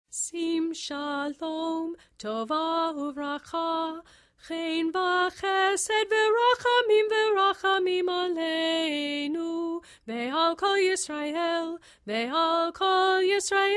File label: Sim Shalom sung; saturday morning